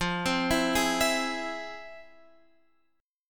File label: F Minor Major 7th, F